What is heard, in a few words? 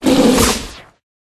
Tiger